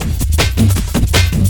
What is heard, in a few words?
Drum & Bass, 160 BPM